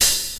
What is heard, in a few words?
fresh studio open high hat b key, Royality free open hat sample tuned to the B note.